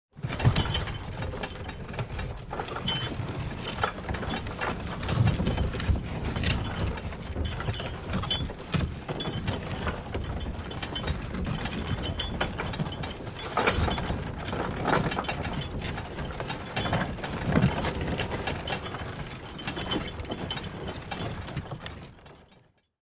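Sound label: Wagon Wheel Wood Roll, wagons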